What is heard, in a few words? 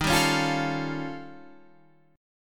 Eb 7th Sharp 11th Eb; strummed